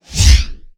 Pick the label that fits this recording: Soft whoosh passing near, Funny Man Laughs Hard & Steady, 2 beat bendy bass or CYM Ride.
Soft whoosh passing near